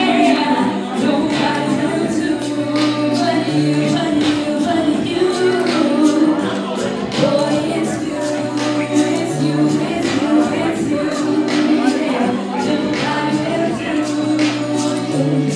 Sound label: live song